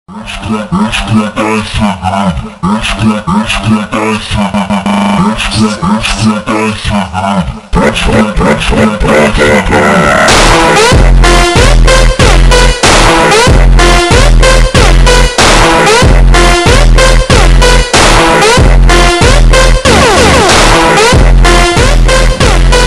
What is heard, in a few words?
funk super slowed reverb